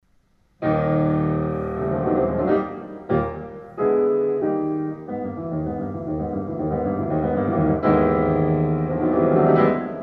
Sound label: tube